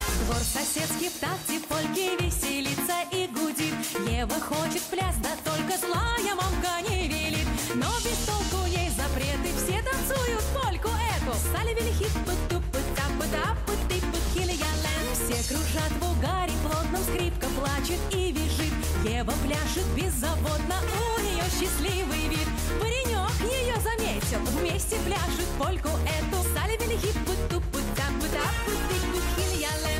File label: Polka Russian